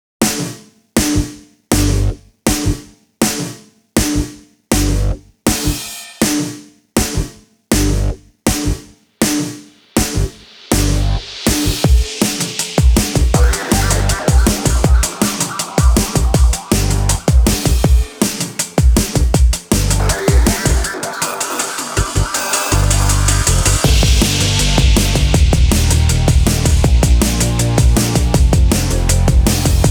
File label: (Beat Only)